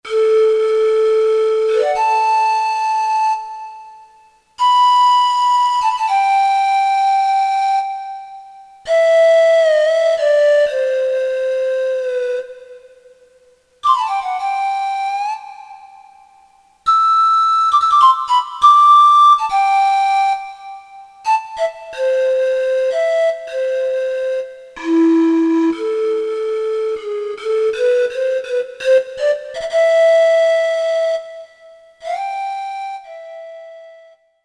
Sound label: PanFlute, Korg DSS-1; Woodwinds